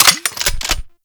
Double Barrel Shotgun Fire Mech; Warframe

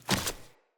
default step, steps; Characters; Ambient; Sprout Farmers

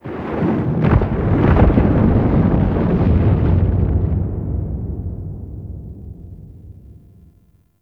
Moon Earth Crack Large; Ambience; Warframe; Orokin Moon

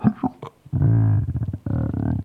Landscape Mushroom Creak Alt

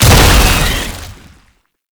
Technocyte Explosion; Enemies; Gameplay; Lasrian Tank; Warframe

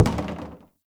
Plastic Hit Short

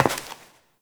Step Metal; Warframe; Koumei

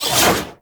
Warfan Whoosh; Warframe; Tenno; Broken Frame

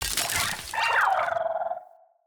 Freeze Mote Vocal

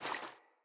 Rat Guide Water Step

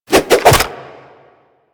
Nunchaku Quick Melee Return; Weapons; Warframe; Tenno

